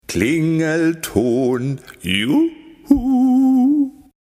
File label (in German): klingelton